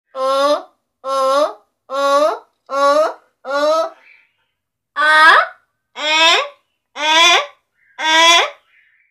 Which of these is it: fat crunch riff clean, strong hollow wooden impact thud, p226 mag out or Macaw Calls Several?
Macaw Calls Several